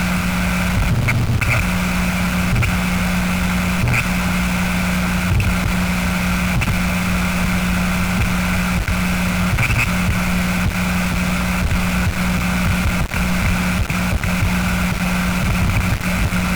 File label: Distortion squiggly